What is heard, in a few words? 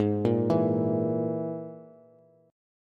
Ab Suspended 2nd Sharp 5th Ab, Bass; arpeggiated; x,11,8,9; JGuitar